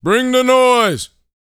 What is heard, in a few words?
VOCAL F; ILIO